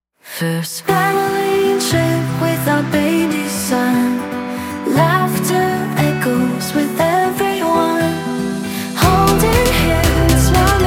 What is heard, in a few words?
family trip pop summer upbeat song